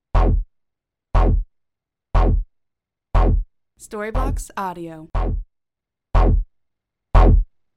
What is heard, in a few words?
Ambient House Bass FX 120BPM D